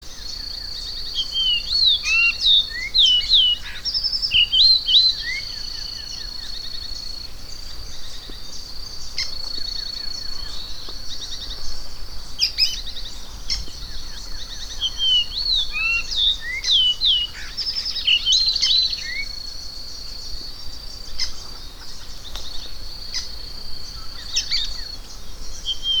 Canto do encontro

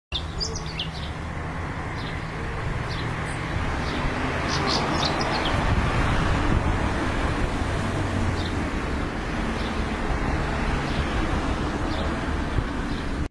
Canto da andorinha das rochas africana

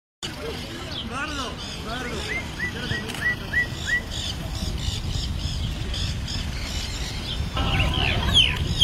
canto do maina de crista

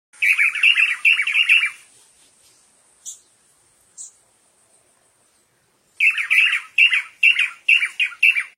canto do tangara de cauda comprida

